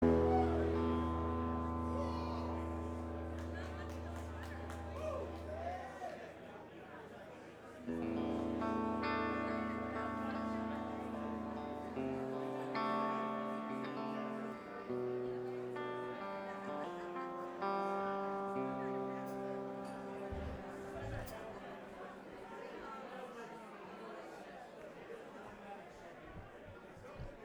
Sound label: tuning